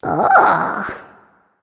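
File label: nonverbal surprise; smite; ratatoskr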